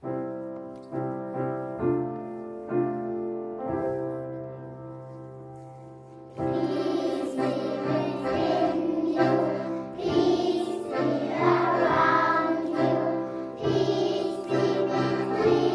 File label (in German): Kindergarten Lied Klavier; Weltfriedenswoche